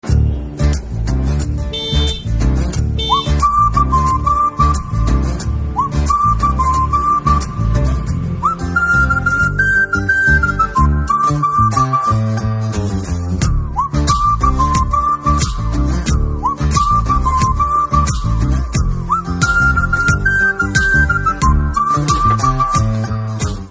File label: new whistle